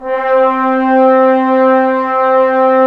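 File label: brass trombones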